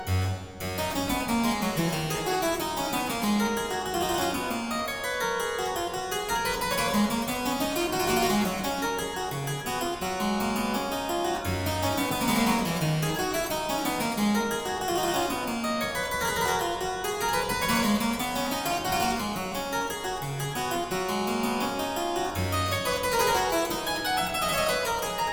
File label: Aria Variations in G Major, Harpsichord; La Capricciosa; BuxWV 250; Buxtehude; Opera Omnia; Harpsichord Works